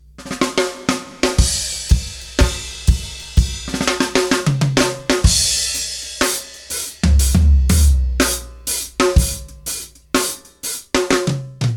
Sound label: jammin reggae